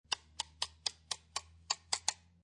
Paper Knife Tap Blade, Paper knife, tapping blade, clicking sound.; Classroom; Schools